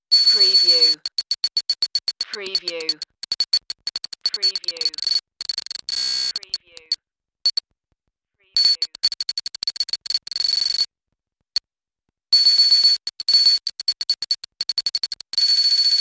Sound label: data error beeps